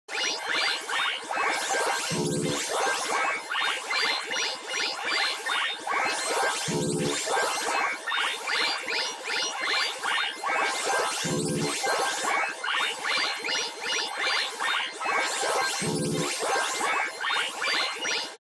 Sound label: Interface Beeps Happy Ghost; lesser vibes